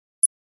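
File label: Flash Beep Electronic Tap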